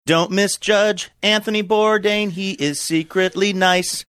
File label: singing dont misjudge he is secretly nice; anthony bourdain